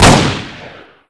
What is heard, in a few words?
desert eagle fire; wartso